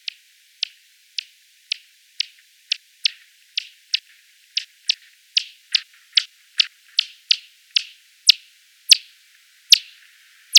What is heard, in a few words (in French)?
M crypticus claq abs bas; Puy-de-Dome; M-Barataud; Acoustic Ecology of European Chiroptera